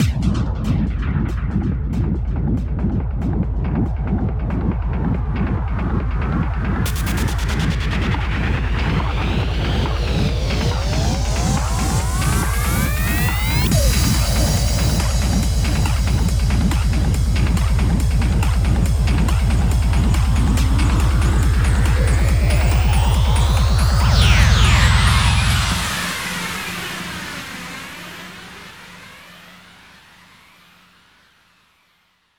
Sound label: 140BPM Drone; FX 16Bars; Vengeance Samples; Snares FX Pairs